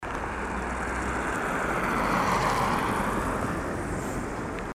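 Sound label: sound of road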